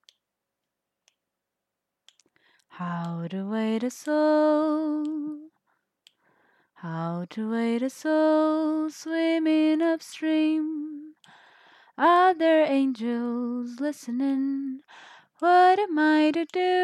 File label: singing, cover